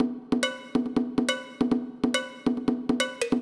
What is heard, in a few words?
Beat Congas, 140bpm; Zero-G Sound Sense Grime Scene; GS Construction Kits; Astoria; 90 s Sample CDs